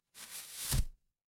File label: paper fabric fabric